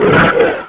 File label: dullahan damage